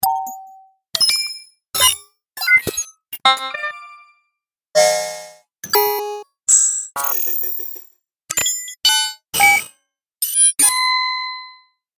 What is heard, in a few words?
Confirmations